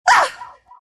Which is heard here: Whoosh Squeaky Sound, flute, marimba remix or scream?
scream